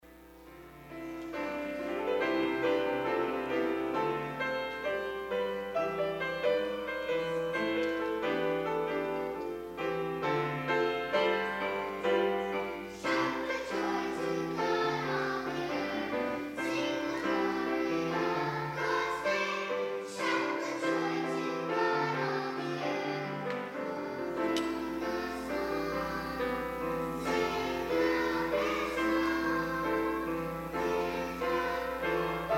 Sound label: Shout with Joy!, Junior Choir